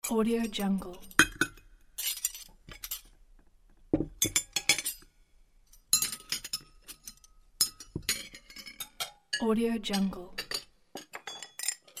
cutlery

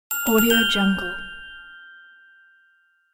notice; winds productions

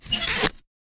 engineer quick tape fix; war ui class specific; esmteam

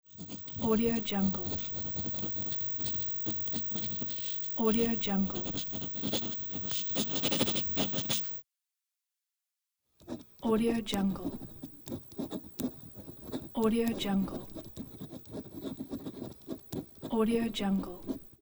pen writing

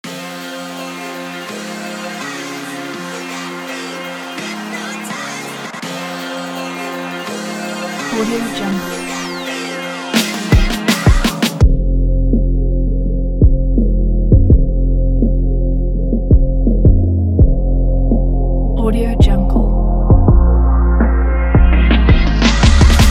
driving hiphop

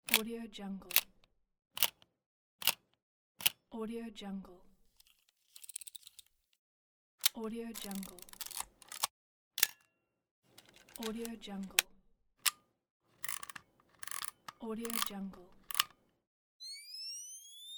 classic film camera sounds; luishpms

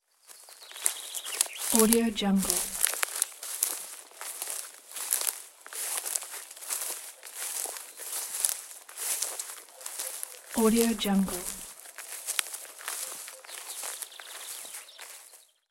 walking on forest grass and branches with birds

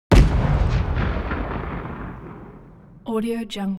weapons missile medium explode distant

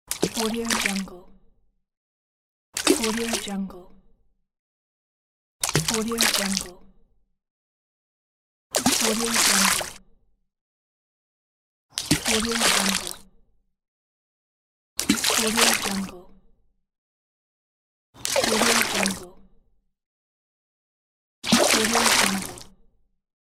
water plop splash collection

